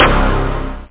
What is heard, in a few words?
Bass Spark